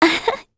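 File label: Laugh, Korean; voices; heroes; Talisha; kings raid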